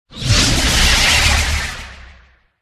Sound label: tiger